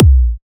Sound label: Kick House